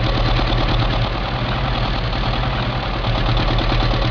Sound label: tank engine run; tankwars